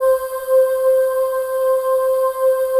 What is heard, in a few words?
PAD, ATMO PADS; Best Service; XX Large